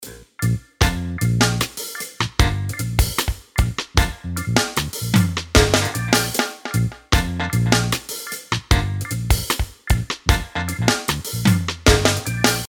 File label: Easy Reggae